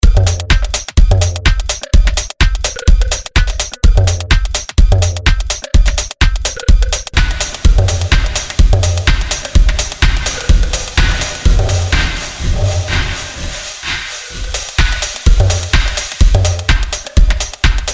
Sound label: FX Reverb; TRAKTOR PRO 2; traktor scratch a10